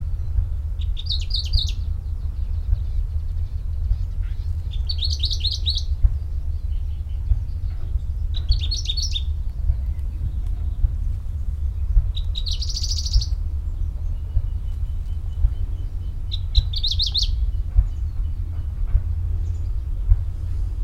warbler grey crowned Seicercus tephrocephalus

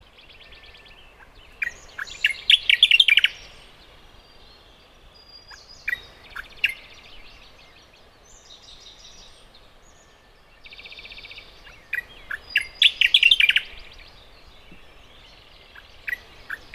warbler gray grasshopper Locustella fasciolata

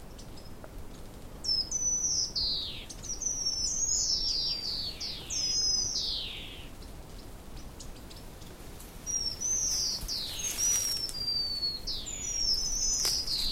parrotbill fulvous Suthora fulvifrons

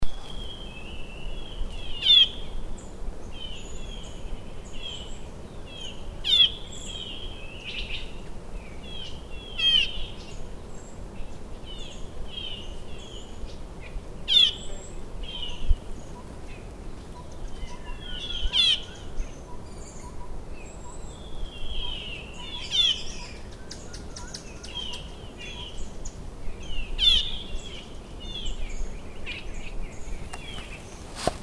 yuhina stripe throated Yuhina gularis

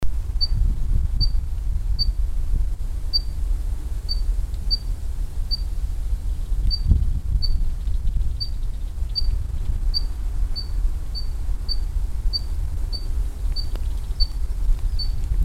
warbler sakhalin leaf Phylloscopus borealoides

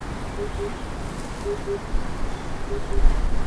cuckoo oriental Cuculus optatus

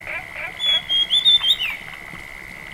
fulvetta dusky Alcippe brunnea

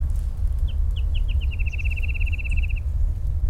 parrotbill reed Paradoxornis heudei

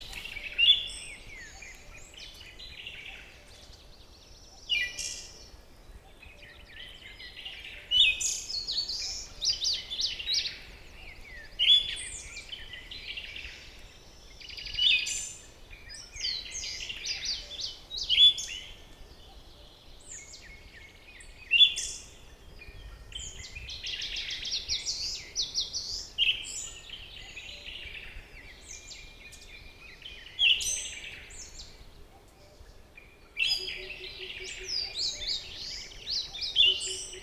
thrush siberian Geokichla sibirica

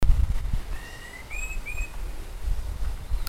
laughingthrush buffy Garrulax berthemyi

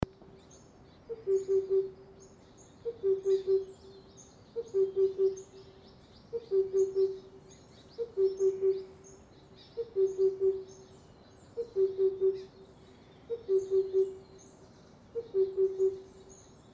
cuckoo himalayan Cuculus saturatus

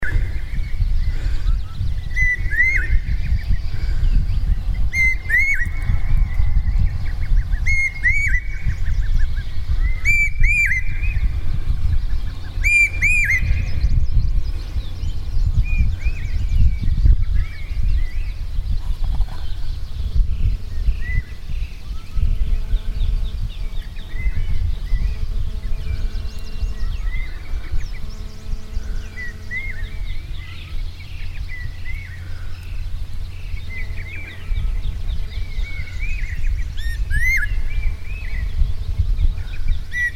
hawk-cuckoo large Hierococcyx sparverioides

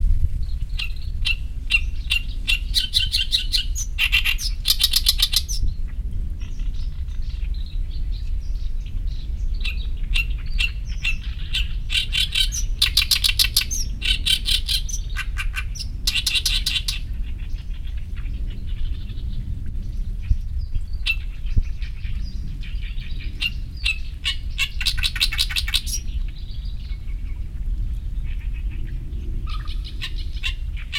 warbler oriental reed Acrocephalus orientalis

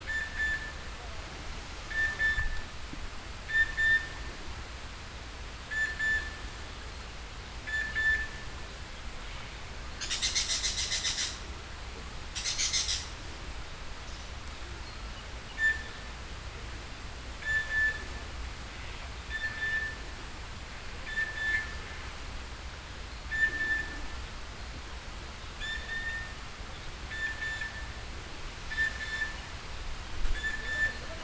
cuckoo chestnut-winged Clamator coromandus

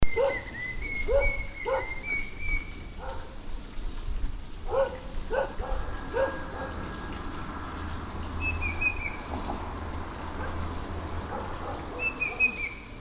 cuckoo banded bay Cacomantis sonneratii

